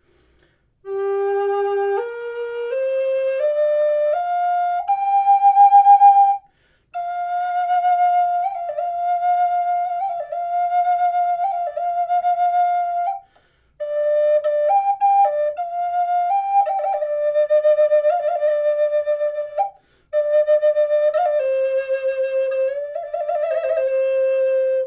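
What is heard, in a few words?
natural, flute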